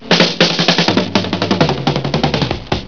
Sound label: drum intro